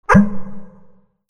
KITCHEN

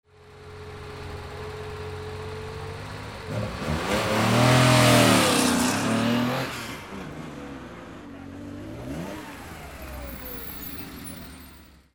CARS

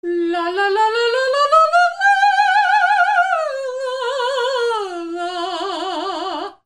PEOPLE

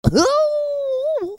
CARTOON

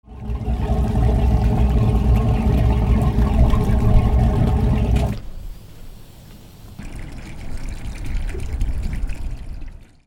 BOATS

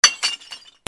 BREAKING

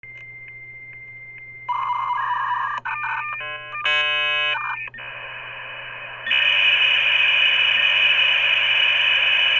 PHONES

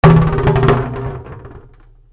FALLING

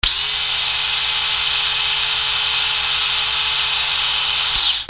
BATHROOM

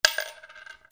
MONEY

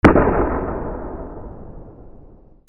WEAPONS

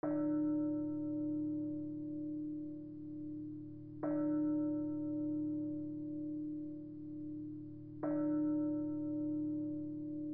BELLS

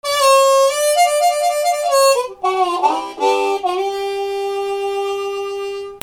MUSICAL

